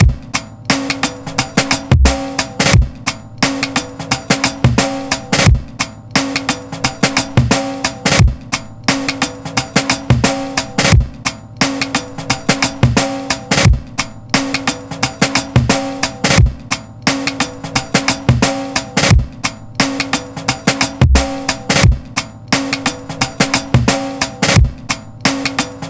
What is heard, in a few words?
RIDE THE RIM, 88 bpm, Breaks; POTBreaks